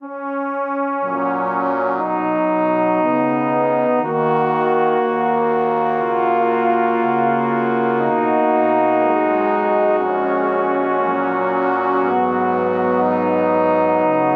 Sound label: Progression The Pursuit Of Happiness I IV vi I IV I ii V Gb Major 60 bpm, Brass Quartet; MIDI Clips; Ableton; Spitfire Audio